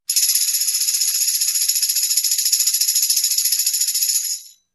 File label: Tambourine Rattle